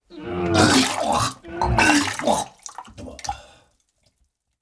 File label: vomiting